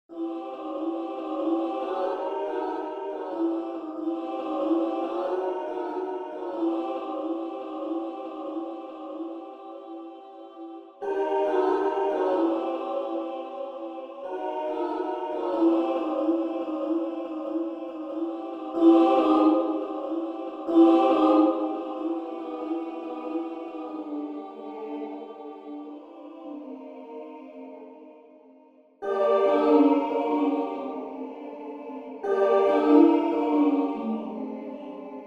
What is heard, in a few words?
Hebrew version female choir